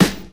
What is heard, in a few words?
dry steel snare drum G key; Duration 0:01; Channels Mono